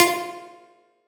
Riddim Melodic Hit - F Crickets; One-Shots; Ghosthack; Riddim Essentials Volume 2

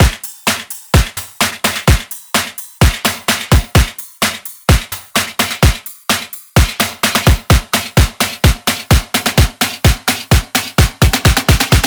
Tension Buildup Drums - 128 BPM, House; Loops; Cymatics; House Starter Pack

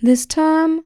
This Time, Vocals Dry

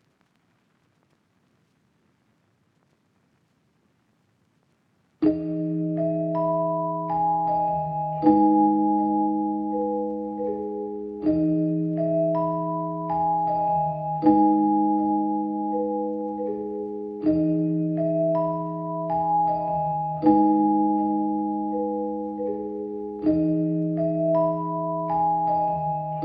160 BPM G# Min Keys; Melody; Loop Stems; Cymatics; 2022 Melody Collection Platinum Expansion - Pt 2; Stories

